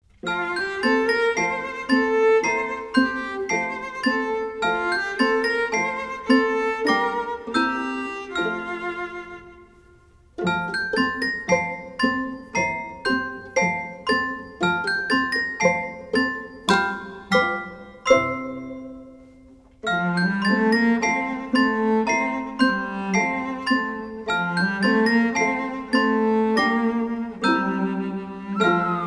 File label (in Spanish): musica solo